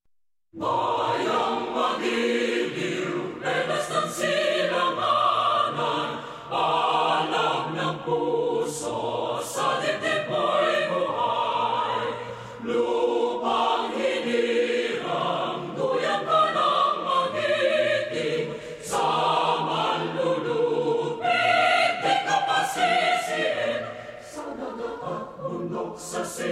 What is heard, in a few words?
philippines national anthem